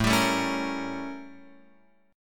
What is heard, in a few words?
A 7th Flat 9th Guitar Open F; A7b9 strummed; JGuitar